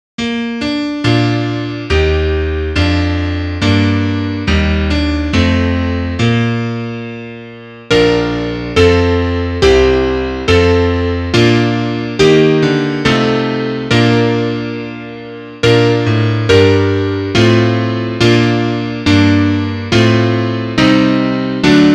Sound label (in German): Klavier; Liederbuch; vom himmel hoch